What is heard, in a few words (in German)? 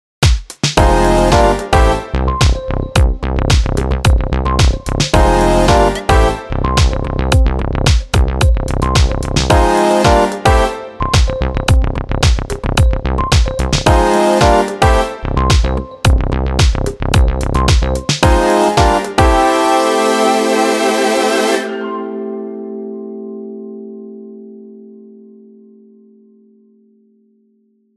Instrumental